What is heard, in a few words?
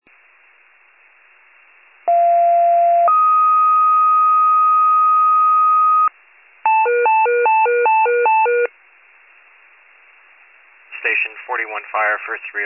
FIRE pager alert